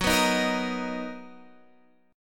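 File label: B Major 7th G flat, chord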